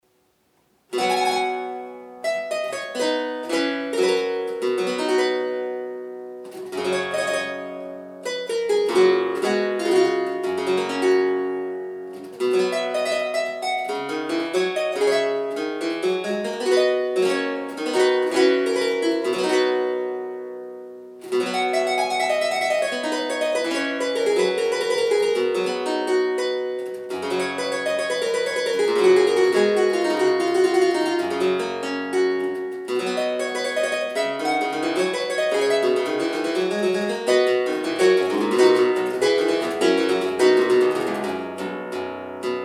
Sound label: harpsichord